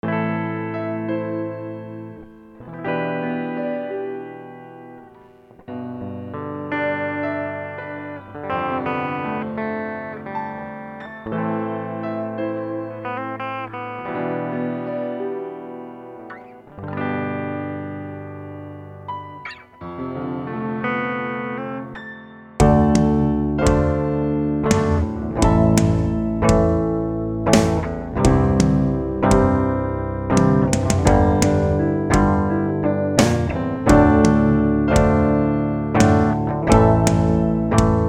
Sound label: Mel Inst Rock